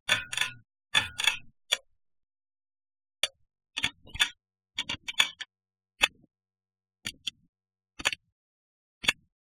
app button clicks shing sound effect; sonniss gdc

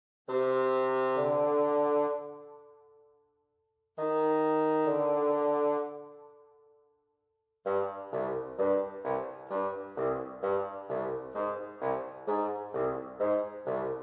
Orchestra Bassoon; McRoMusic; Summoning Circle

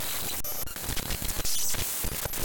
ui glitch glitching digital data corruption loop

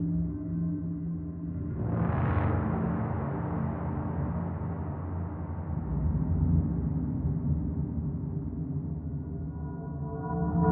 Dark Brooding; World Tree Roots

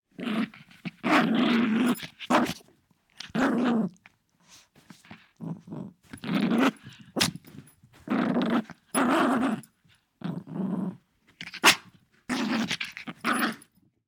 dog yorkshire terrier growl; fienup; sonniss gdc

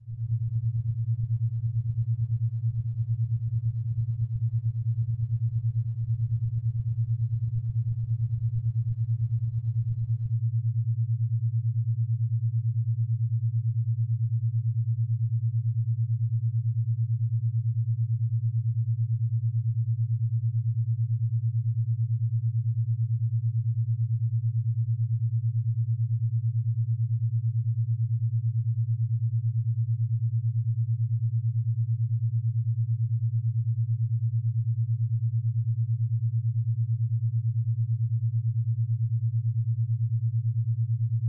110hz 9hz alpha waves; sonniss gdc

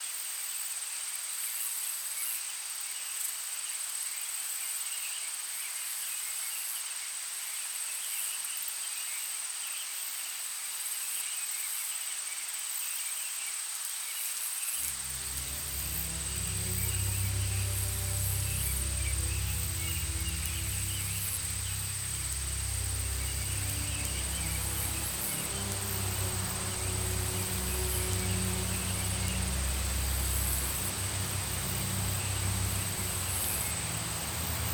ambiance the grassland rain, music

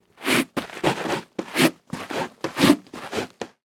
footsteps extras doormat scrapes; fvtt sonniss gdc audio

